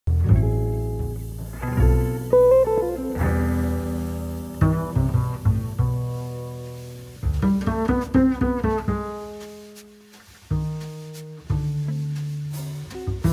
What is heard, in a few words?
Bass solo excerpt